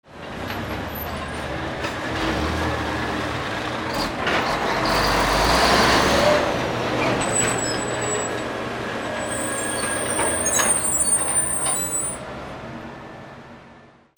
AMBIENCE

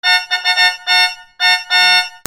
CARTOON

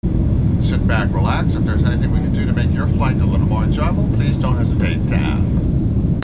flight

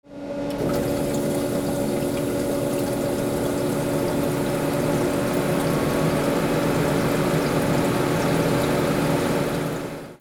INDUSTRY

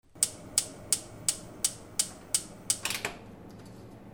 KITCHEN

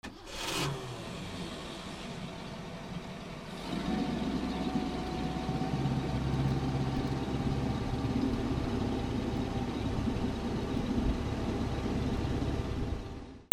BOATS

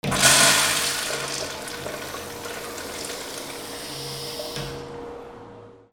BATHROOM

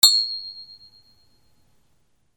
BANGS

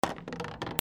BILLIARD

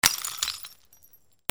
BREAKING

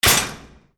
DOORS

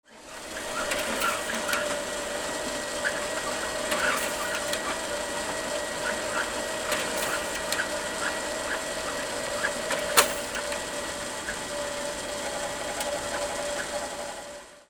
OFFICE

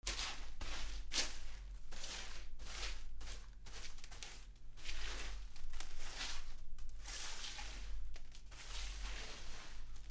HOUSE

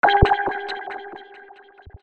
SCIFI

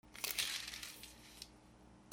RIPPING

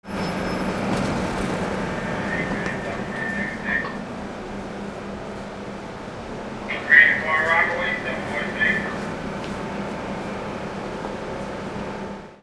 VEHICLES

